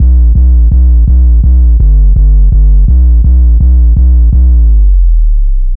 Hard Sweep Down, Bass Lines 166.5; Jungle Warfare 1&2

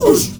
MALE WAR, Heart of Africa, shout

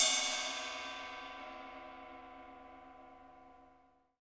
CYM Ride; Best Service Gigapack 2

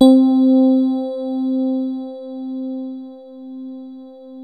DELICATE, DX RHODES; Best Service Advanced Media Trax Modern Composer

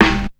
Snare, Drum&Bass; Total Drum&Bass; 90 s Sample CDs

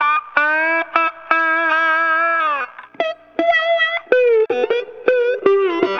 Slide Guitar

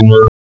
SWEEP; CMI Sound Library Rev 1.3

